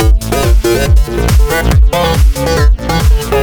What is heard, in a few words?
Electro